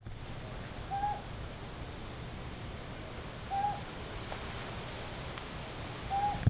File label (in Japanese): ryuukyuukonohazuku, bird song; リュウキュウコノハズク; さえずり; 沖縄県石垣市; J-eco 鳥のさえずり図鑑